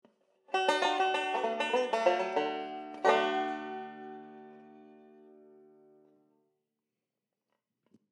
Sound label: d tuning, banjo